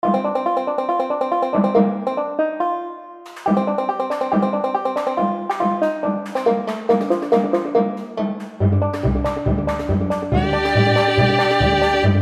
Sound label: Pig Racing